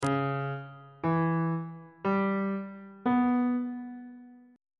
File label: c flat harmonic minor 7th chord 2 is d flat half diminished 7th on bass clef